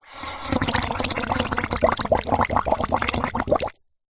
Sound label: nature water air blow through tube surface water bubbles